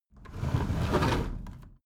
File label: window roller shutter close soft single pull